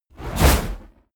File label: whoosh fire noise blast small